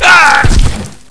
player die

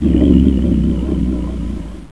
pit drone idle; svencoop; cryocell

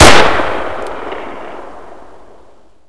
g36e fire; firearms

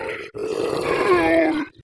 zombie voice idle; svencoop

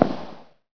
thud

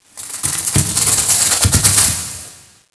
catacomb; gearbox

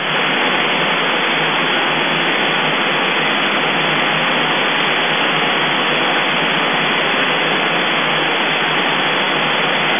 radio error; tfc

